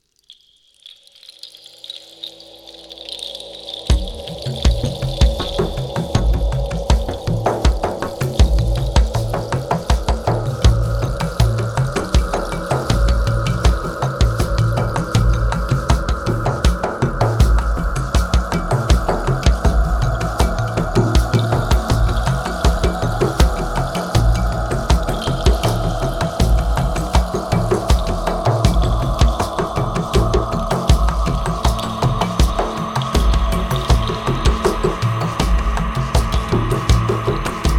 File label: Atmospheric Winds